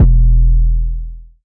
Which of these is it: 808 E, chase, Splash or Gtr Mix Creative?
808 E